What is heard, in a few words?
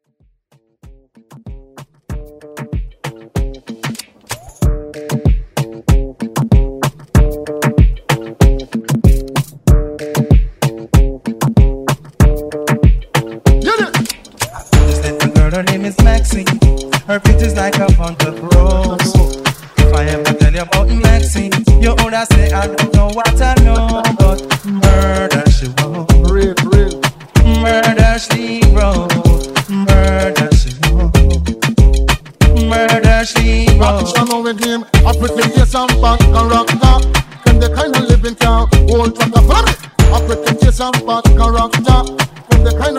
Moombah Reggae